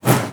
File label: BOW PERC, BASS FINGER; Xsample VOL-5